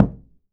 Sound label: KOO KU M, KOREAN DRUMS; AKAI S6000 CD-ROM; WorldSounds